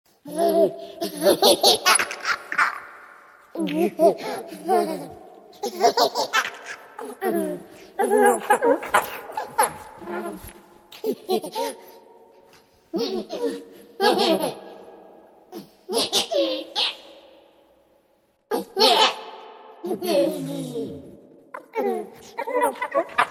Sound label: the most disturbing baby laugh ever recorded